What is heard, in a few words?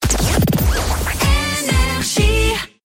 Jingle; HYPER ID; NRJ; RAPID 2023